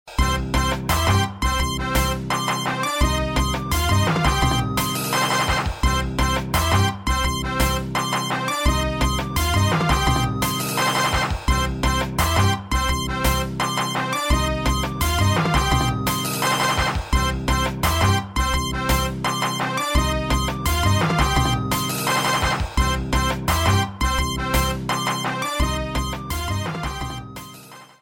trompetas

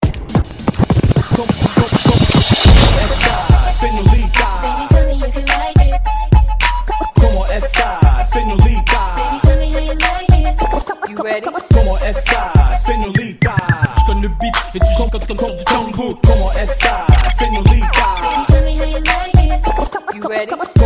dj espanol tono de llamada

